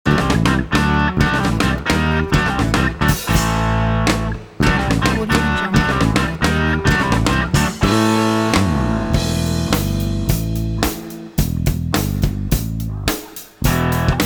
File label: vintage rock; sound-ideas